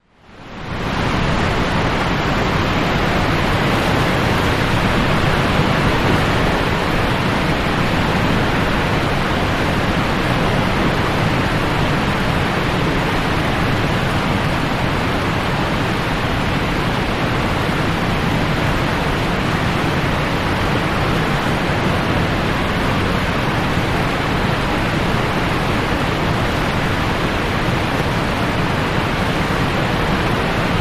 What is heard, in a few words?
Niagara Falls Hvy Fro, waterfalls